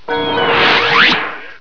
Floop; iLinux

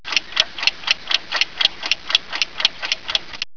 Timer; iLinux

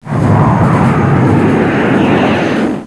Jet; iLinux

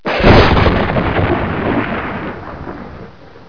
Thunder; iLinux

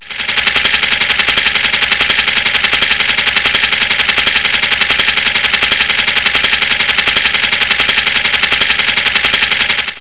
Sewing; iLinux

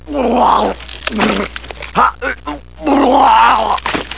Puke; iLinux

